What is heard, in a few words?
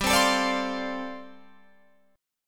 G 7th Suspended 2nd Suspended 4th, Guitar Open F